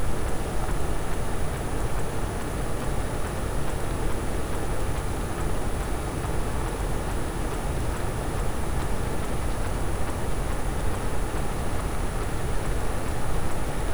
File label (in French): cachalot negatif, Physeter Macrocephalus